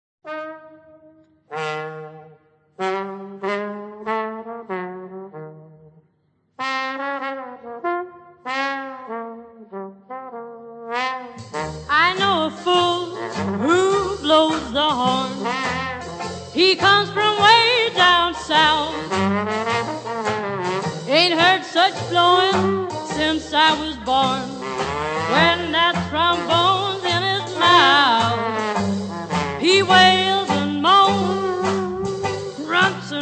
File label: Trombone